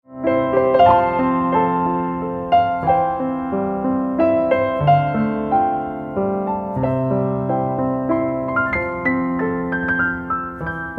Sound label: lullaby; brahms